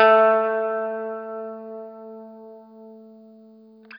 Guitar & Bass; Quantum Leap; 90's Sample CDs

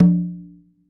Conga Hit, Latin Percussion, One Shots

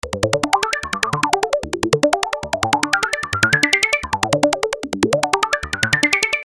Arpeggio 150 D, Techno Synth Loops; Sonic Foundry (Sony Creative Software); Signals I

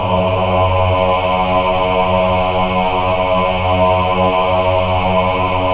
men ah, Choir of Ahs; Roland - LA Composer vol. 1

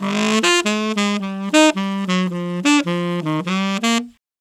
Tenor in Bb, Tenor Sax Loops; Sonic Foundry (Sony Creative Software); Jazz Solos and Sections

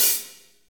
HAT, Roland Fast Rock Hi-Hats; Northstar; Drumscapes Roland

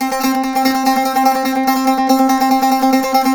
SAZ; Roland - LA Composer vol. 3

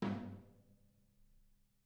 R B Rack Tom B Room; Toms; Sonic Foundry (Sony Creative Software) - Drums from the Big Room The Mixes; R&B One Shots

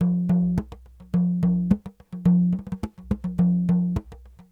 Sm Frame, World Percussion

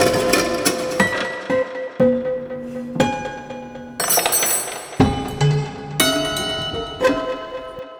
Prepared Piano Loop; Sonic Foundry; Sony Creative Software; The Electronic Music Manuscript Collection

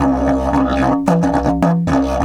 108, Didgeridoo

